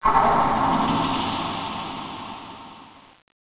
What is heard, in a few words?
strange